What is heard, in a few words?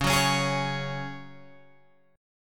Db Suspended 4th Db, Guitar, Open G; strummed